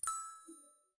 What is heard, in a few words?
furniture